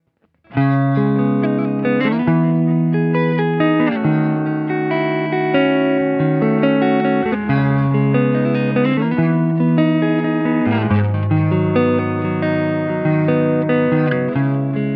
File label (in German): Clean auf der Halsposition